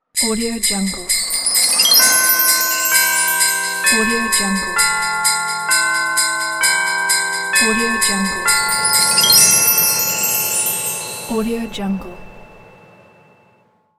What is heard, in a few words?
christmas bell